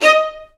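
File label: Vio spiccato Eb4; Digital Sound Factory Virtuoso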